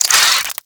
Infested Ashen Spike Cast; Warframe

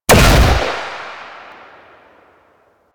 Shot Single, Pistol; Weapons; Grineer; Amphis

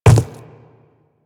Quadruped Medium Walk Default, footsteps

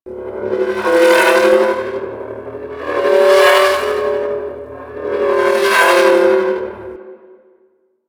Hologram Loop, Ship; Ambience; Props; Warframe; Corpus Ship Remaster

